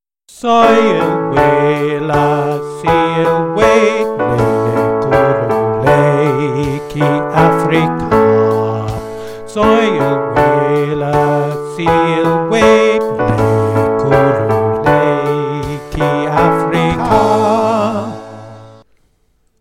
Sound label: bass voices drums